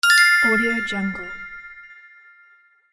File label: sparkle swell